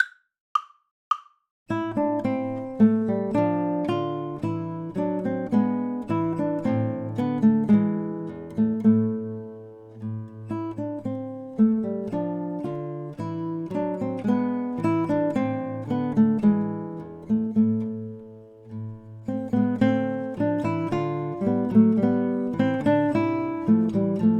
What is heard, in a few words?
Bourree, guitare